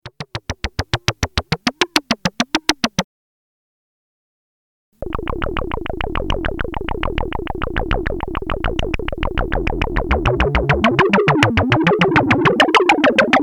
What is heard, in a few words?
EMS Logik